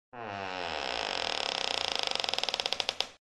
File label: Door squeaking